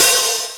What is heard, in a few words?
Hat Open, Linn 9000